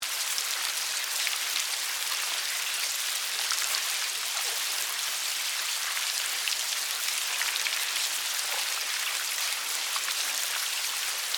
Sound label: Larva Maggots Move, Large, Group, Wet, Slimy, Insects, Bugs; Animal